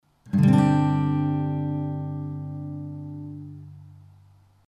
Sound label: Gsus4, Guitar chord